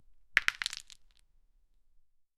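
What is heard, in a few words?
simple game seashell being, break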